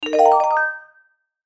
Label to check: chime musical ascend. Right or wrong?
Right